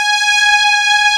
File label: Textural Strings